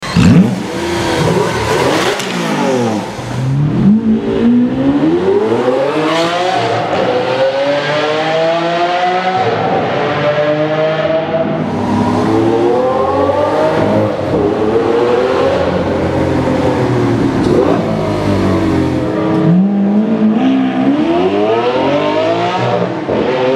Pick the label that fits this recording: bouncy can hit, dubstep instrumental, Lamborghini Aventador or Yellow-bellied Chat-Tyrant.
Lamborghini Aventador